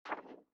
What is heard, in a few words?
SFX inventory Open; gamerip; Out of Reach: Treasure Royale